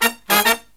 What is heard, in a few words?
Killer Brass Riffs, 108 soul; USB Soundscan vol.29 - Killer Brass Riffs; AKAI